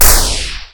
Synthetic Gunshot

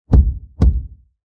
Thumps

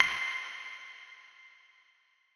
Bright Hit

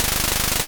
Pss Drum Roll

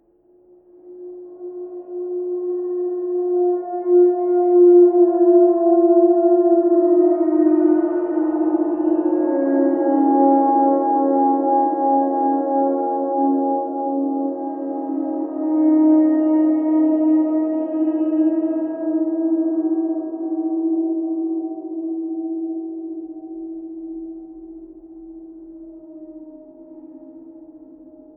Creepy Dissonant Siren

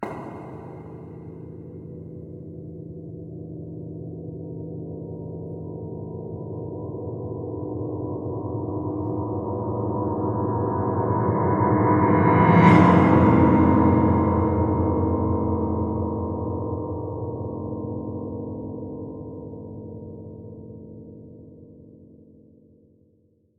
Piano Drone

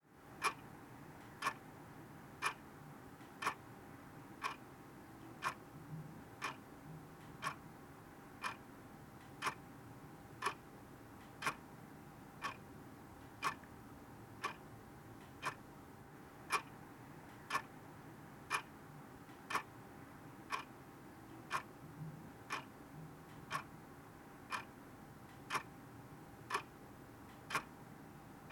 Ticking Clock Bedside

